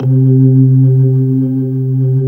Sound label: C3, Keyboards Old School; USB Soundscan vol.09; AKAI